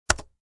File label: keypress sound